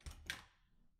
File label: Door Open